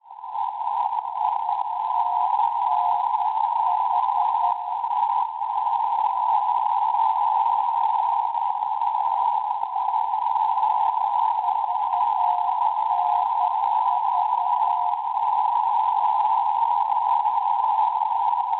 NDB, Vilanova Spagna 380 kHz; VNV; Schwarzsee

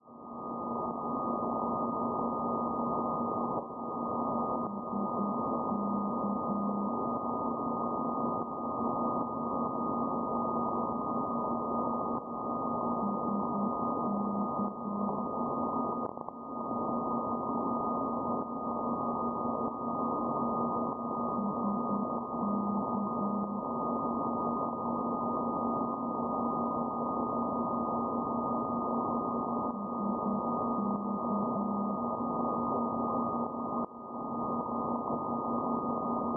NDB, Zagreb Croazia 350 kHz; SK; Perseus; Schwarzsee

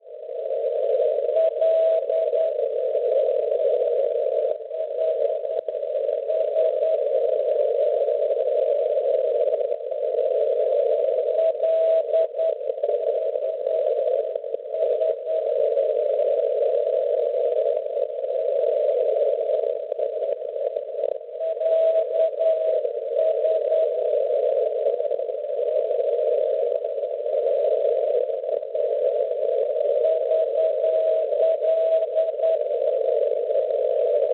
NDB, Tampere Finlandia 365 kHz; VS; Schwarzsee

